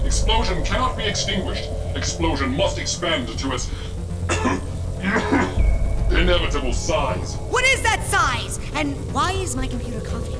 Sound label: computer coughing